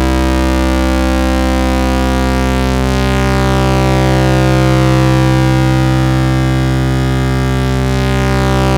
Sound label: low PWM, pads; Zero-G Ambient 1